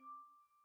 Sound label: glass D5 Slow Decay, Wine Glasses; Idiophones; Friction Idiophones; Releases